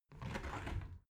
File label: window open soft